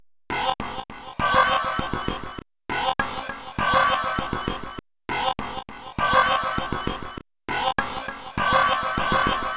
Lubricated Voice, Noise Circuits

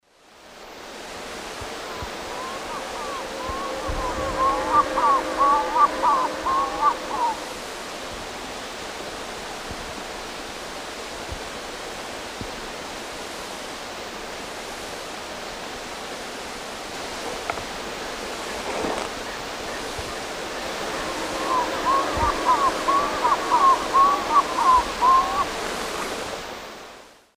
Gallinago paraguaiae, calls; S. America; Eastern Lowlands

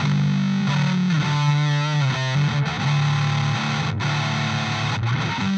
Scooped Mids, Guitars; Rock

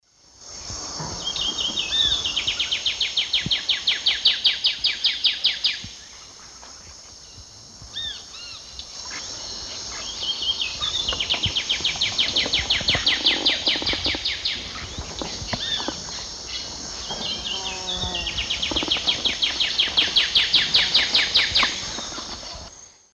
Basileuterus fulvicauda, song; S. America; Eastern Lowlands

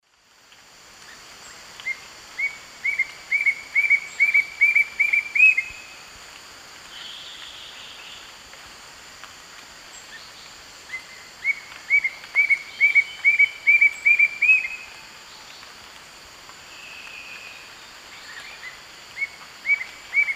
Attila spadiceus, song; S. America; Eastern Lowlands

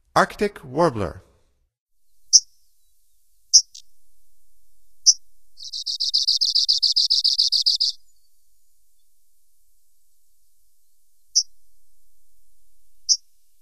Arctic Warbler, Songs of Birds of North America; Stokes

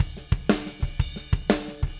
Drums, Rock

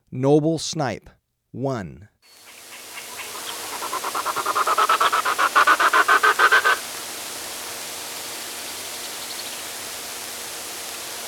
Noble Snipe; S. America

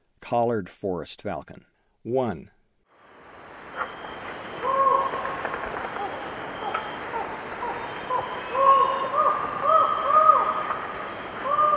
Collared Forest-Falcon; S. America; Eastern Lowlands

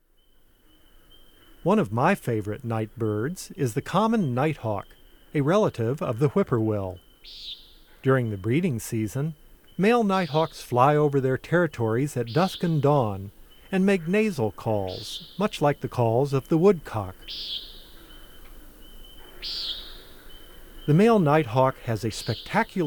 Common Nighthawk, Bird Sounds; North America; Common Western Species; Know Your Bird Sounds

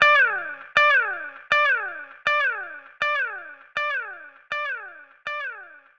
FX Guitar

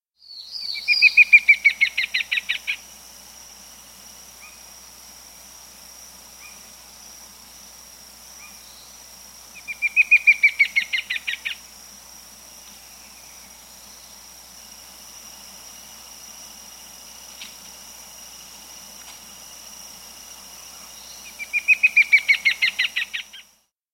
Todd's Antwren; S. America

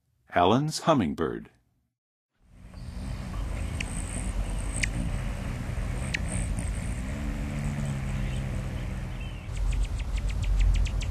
Allens Hummingbird; North America; Western USA; Western USA Songbirds

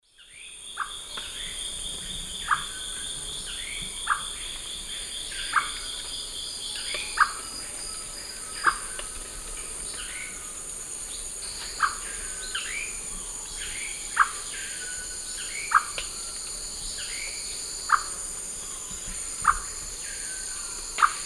Liosceles thoracicus, call; S. America; Eastern Lowlands

